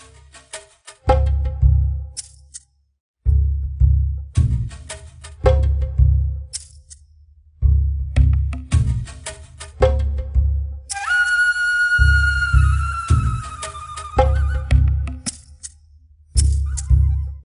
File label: Jungle Level